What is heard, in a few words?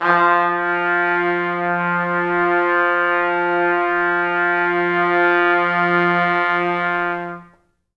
Pop Brass; Best Service; ProSamples vol.19; AKAI